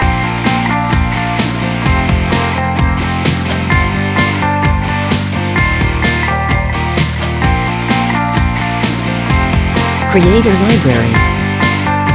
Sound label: Corporate Summer Inspiring Upbeat; Creator Library